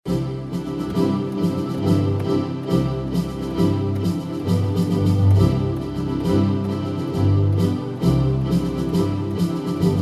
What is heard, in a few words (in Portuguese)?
Piano e Cordas; Bolero; Ravel